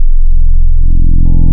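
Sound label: square, wavetables, Classic Synths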